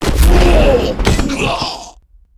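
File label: ghost death, monster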